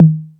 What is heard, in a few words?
Beat Box, E-MU Pro-Cussion; drum machine